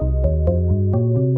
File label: Lil Bass Walkup; Solos